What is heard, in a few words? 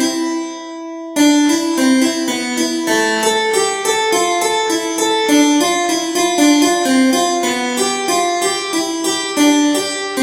Concert Harpsichord Room